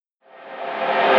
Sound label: Perc Stem Loop, 120, Suckback; Drums; Perc Construction Kits; Ghosthack; Quantum Force 4; Enter The Void